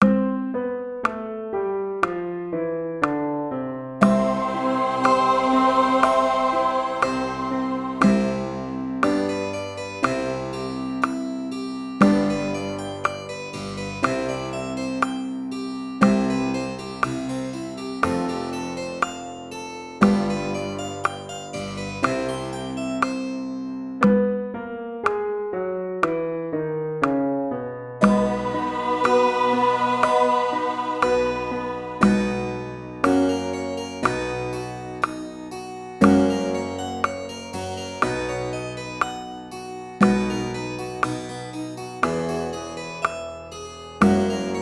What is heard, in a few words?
buzz tenor; tenor trombone; Air/Buzz; Tempo = 60; First Habits Warm-Up